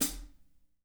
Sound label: HH PD L, Drum 1 Dry; East Collexion